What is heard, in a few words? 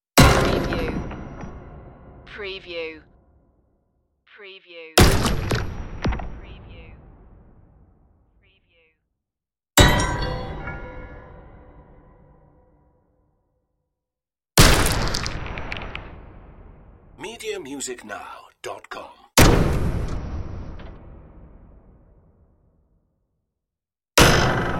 weird impacts